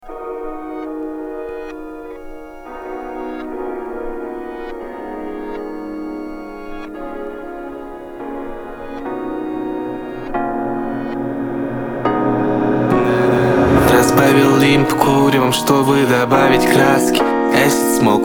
Synth

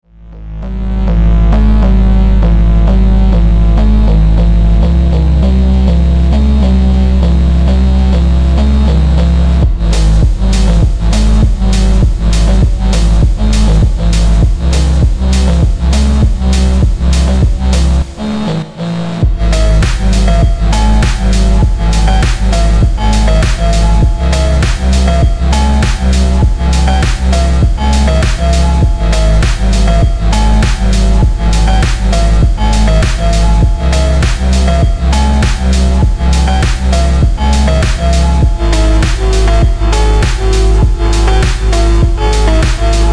FONK REVERB SLOWED; FONK DLIa ZALA

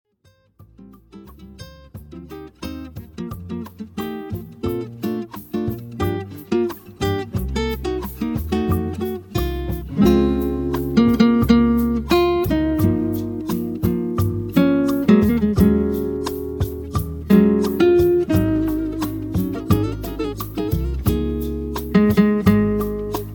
Restaurant Music. Easy Listening Jazz; Restoran. Restaurant Music

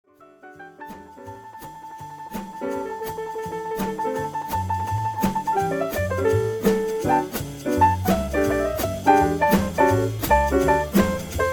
Lounge Cafe Music; Muzyka Dlia Kafe I Barov

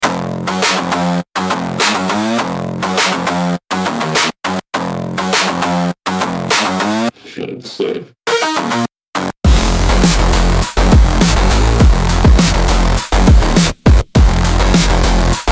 FONK, PHonk

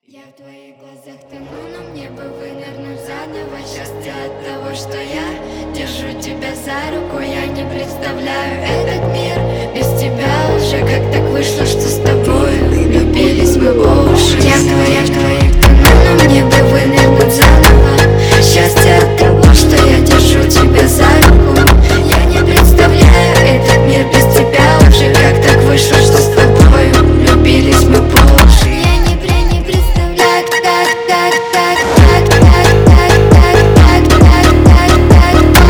Phonk Remix

